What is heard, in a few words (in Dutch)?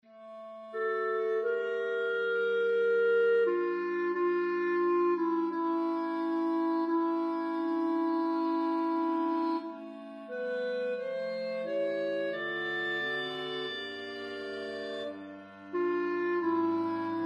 sopraan; Lasciatemi